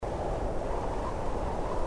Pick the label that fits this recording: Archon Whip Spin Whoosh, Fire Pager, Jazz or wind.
wind